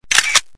Shutter

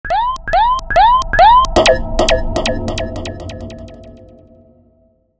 Alarm; Sony Ericsson; Venus (Xperia X1)

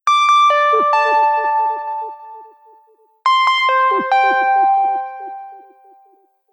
Muzzy tone; Samsung; SCH-M710 T Omnia II

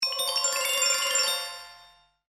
New Message; Sony Ericsson; P900

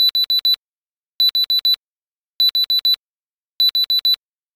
Be-Be-Be-Beep, ringtones; Samsung; Galaxy Watch 8

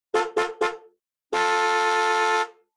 Saloon car; Coolpad; ivvi-K1-mini

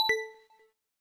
new particle lock; LG; V20; ui

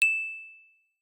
Twinkle; LG

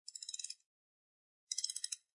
Ring; Allview Soul-X6-Xtreme

